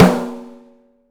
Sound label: OPEN STEEL SN; 3D Drums